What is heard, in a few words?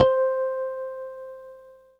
HARMONIC C5; Greytsounds; Scott Peers Sound Engineering